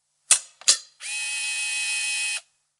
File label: Film Camera Closing And Winding